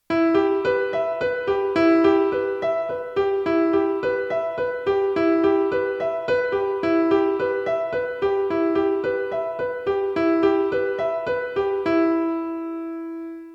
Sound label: World Arpeggio